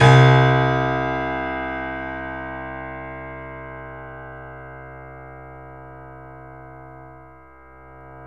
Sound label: C, 3-D Audio Collection, 3D Pianos, Yamaha C7